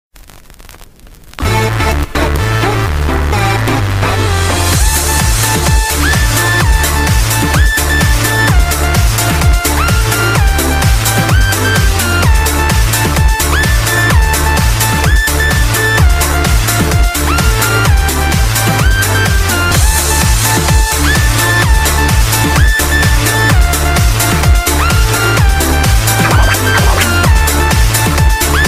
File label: disco shuffle